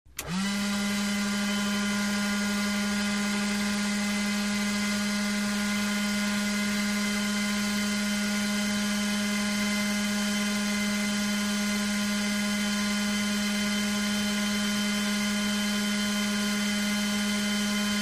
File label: Evacuation Motor, variable speed